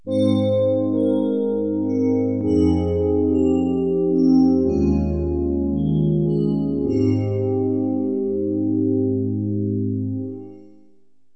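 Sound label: bowed glass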